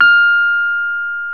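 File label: piano Rhodes Mk.V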